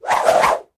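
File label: professional swing of two swords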